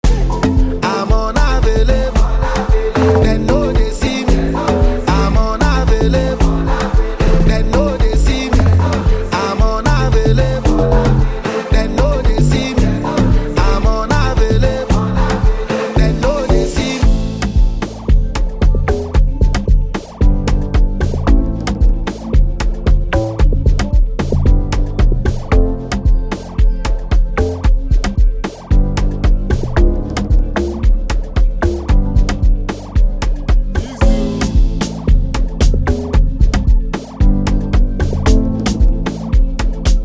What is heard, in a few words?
INSTRUMENTAL HOOK